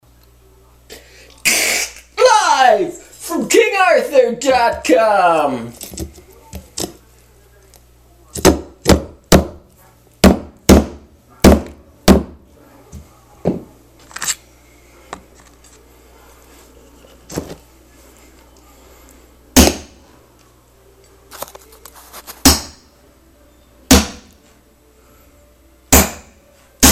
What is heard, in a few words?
Hammer And Pry Bar Mix, power tools